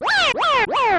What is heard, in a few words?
baby bowser defeated; smw2